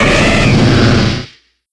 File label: cry; source of the sovereign